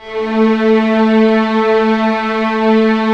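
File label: strings, contrabasses arco, orchestra; Roland LCDP13; STR Orch. f Slow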